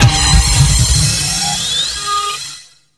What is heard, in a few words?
NOISE MAD